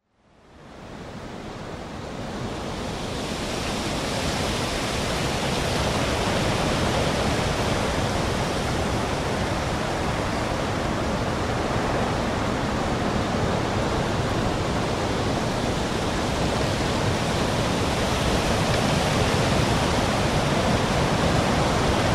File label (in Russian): silnyi veter